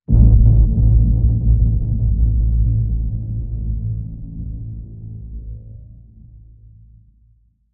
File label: Hit Tonal Rumbling